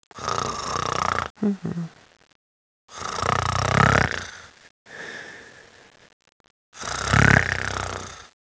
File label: snoring; silly sound effects; taunts pack